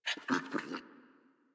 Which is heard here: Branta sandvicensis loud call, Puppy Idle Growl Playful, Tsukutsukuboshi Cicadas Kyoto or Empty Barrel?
Puppy Idle Growl Playful